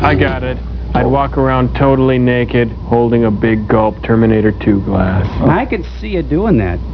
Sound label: big gulp